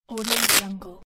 open velcro fastener

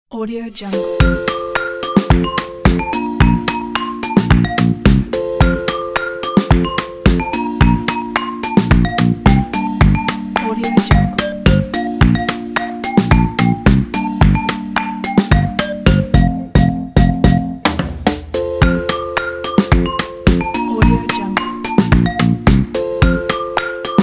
snappy happy fun

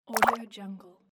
water wrong

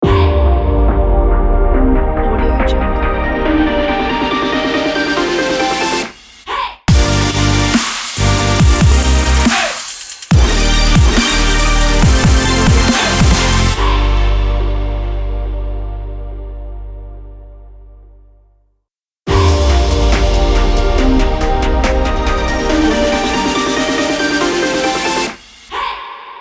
future bass energy logo

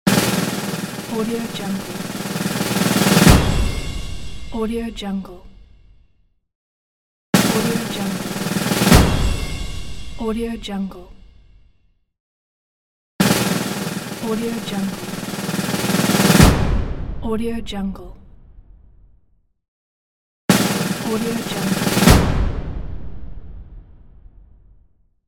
drum roll

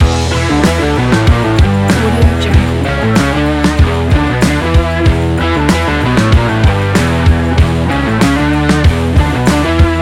rock jingle logo

